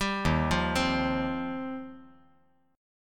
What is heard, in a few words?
D sharp 6th Add 9th